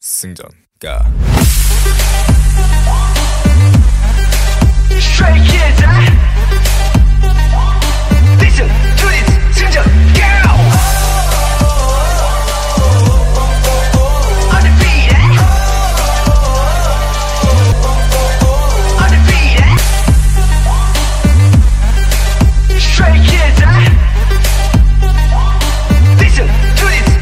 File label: VICTORY SONG